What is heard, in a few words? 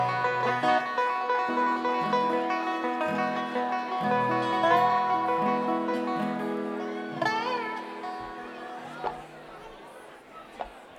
tuning